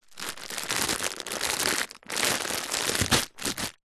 foley packet plastic tightly wrapped small rubber items handling movement